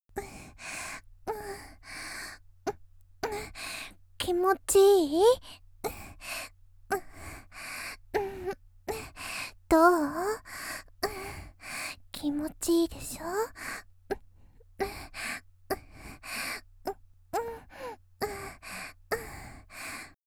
元気な少女, 「手コキ声」（気持ちいい？）と言いながら静かな喘ぎ

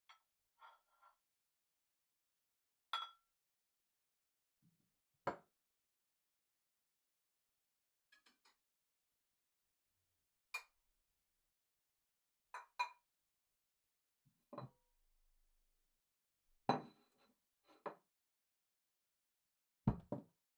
配膳する 音; KU100

